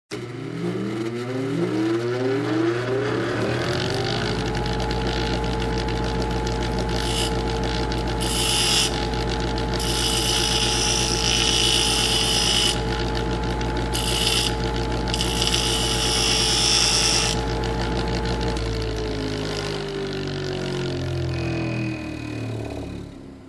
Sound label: Construction